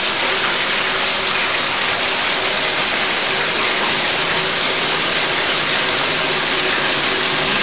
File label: pouring water; cstrike; industrywest